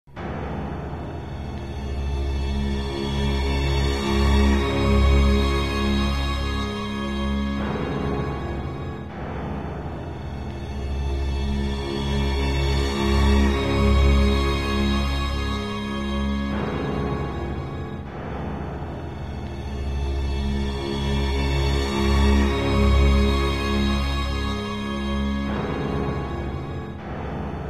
suspense, music; mohaa

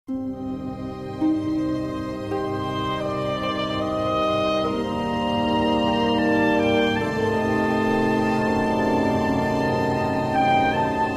success, music; mohaa

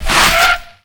bmw bike skid; vehicle; mohaa

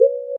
menu back; cdogs

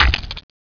gib; xash3d fwgs; dmc

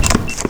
heatseeker switch; cdogs

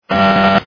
mine detector on; weapons; Foley; mohaa

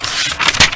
roll; singe; Secret Of Nimh

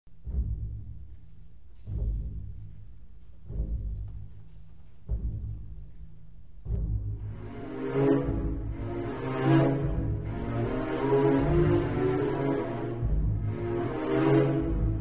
suspense, music; mohaa

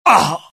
death, pain; mohaa; dialogue

